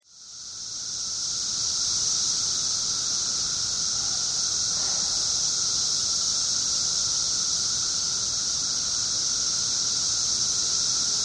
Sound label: cicadas, 17yr cicada; Excerpts of the 17-year Cicada Brood XIII from Glenview, IL (2007)